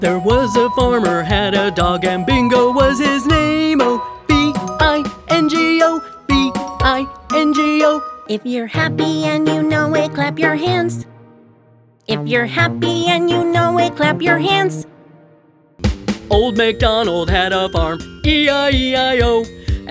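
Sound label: American, songs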